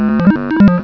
BEEP

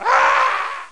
monster

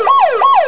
siren

